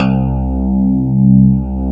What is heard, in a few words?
CLAV, FUNK FM CLAV